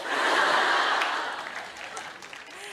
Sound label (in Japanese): 大笑い